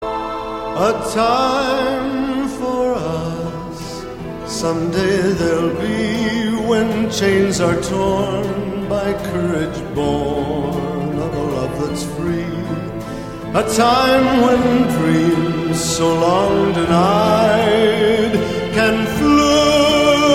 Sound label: Waltz